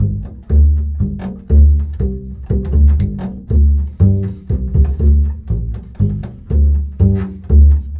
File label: Bull Fiddle, Appalachian